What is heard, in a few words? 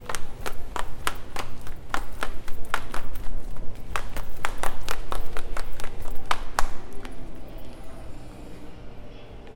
Fast walking Beat